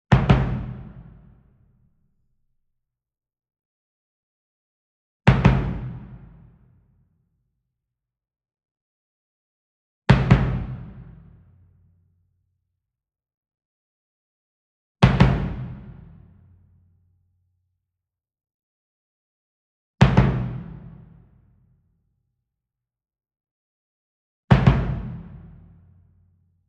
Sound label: SINGLE DRUM Bass Drum Marching Double Soft Beater Medium; boom library; Cinematic Strikes Construction Kit